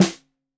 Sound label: Snare 65 Reg Stereo, Deeply Sampled Drums